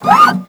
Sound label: Leg Servo; Warframe; Machines; Jackal